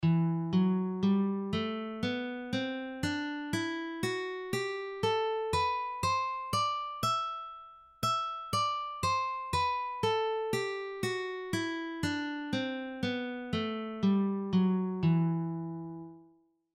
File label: E Aeolian; Scales and Arpeggios